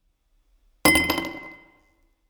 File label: ice